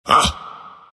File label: grunt; dota; ember spirit